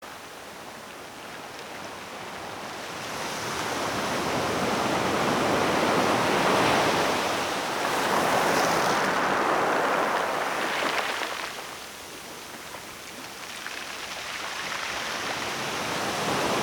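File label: Sounds of wild nature, breath of the sea; Zvuki zhivoj prirody; Dyhanie morya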